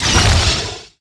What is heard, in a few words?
Spirit Thorn; Gamigo